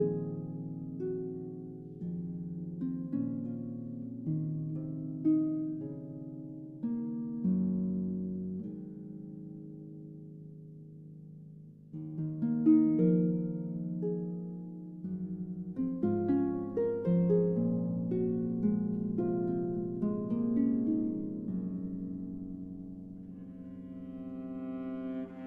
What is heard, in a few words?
Harp Concerto Lullaby